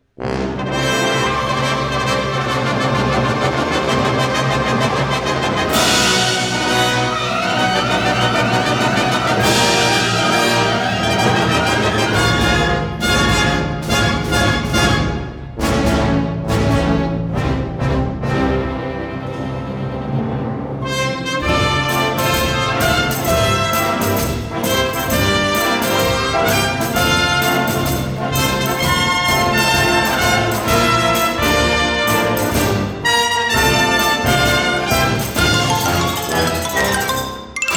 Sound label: Fanfare Happy Birthday; Warner Bros.